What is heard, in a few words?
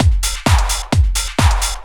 drum 130 dance, drumloops